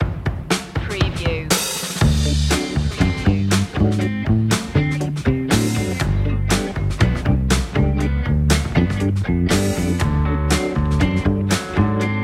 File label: A playful and mellow track, with funky drums, warm percussions; funky groovy